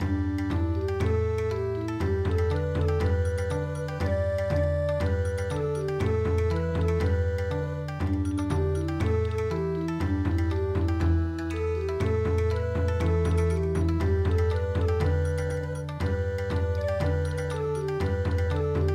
Bon Odori; midi; traditional

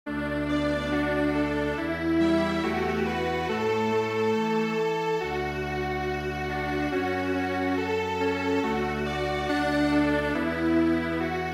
Strings Quartet, traditional

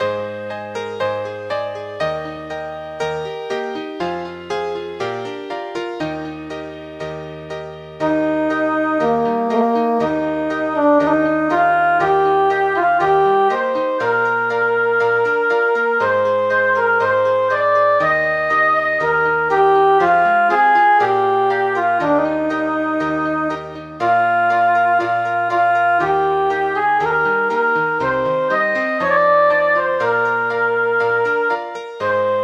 School Piano; midi; traditional

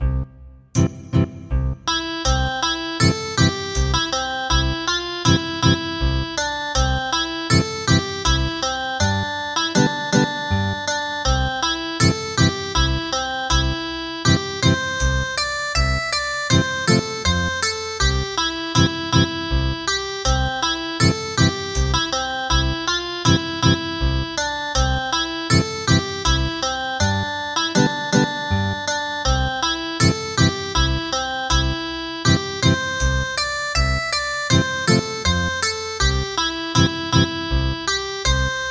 Ethnic Guitar, traditional; The Campbells Are Coming

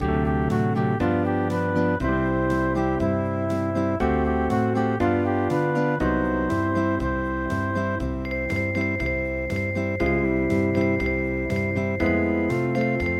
Hawaian; ANAHOLA

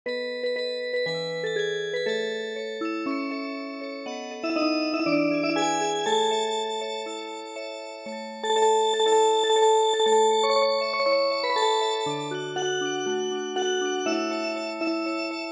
Music Box, traditional

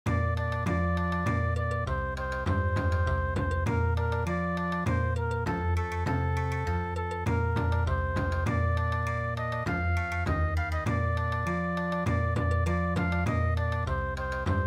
Bon Odori

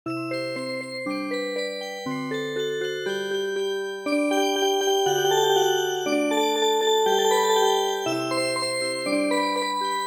swing Music Box

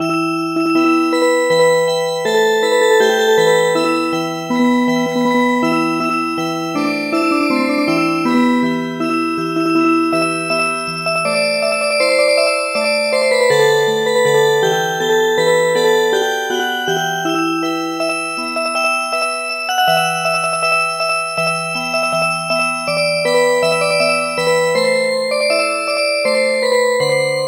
Music Box, midi

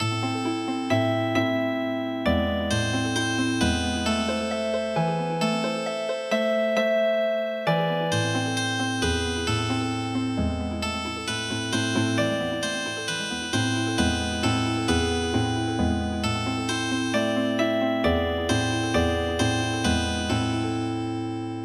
English Old Song Healing Harp

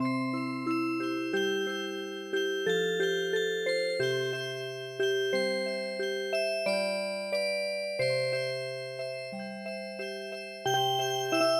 Music Box, traditional

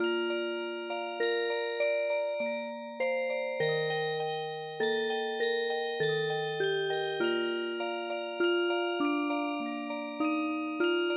Music Box, traditional

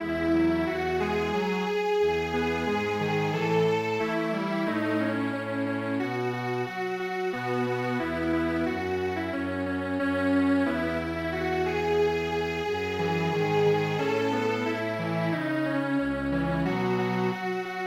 Strings Quartet

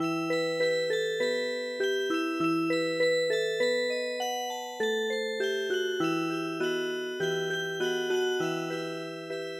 Music Box, traditional; Rainy Garden

